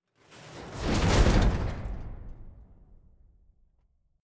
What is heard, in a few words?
fire ball burst